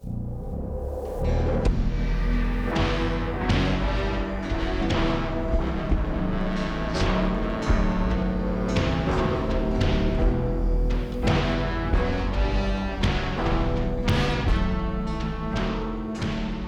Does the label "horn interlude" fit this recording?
Yes